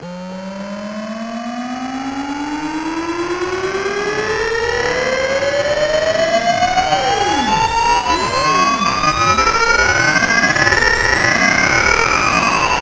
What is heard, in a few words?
riser; ゆめ2っき